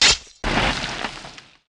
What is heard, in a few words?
Diging Vehicle Loop; 1944 Battle Of The Bulge